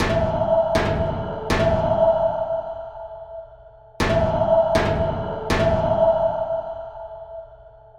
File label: Metal On Metal Harmo Loop 120 Bpm